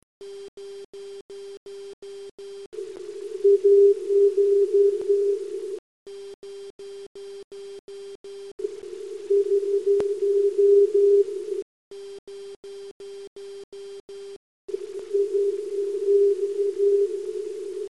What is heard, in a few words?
test 25 Hz filtr; eme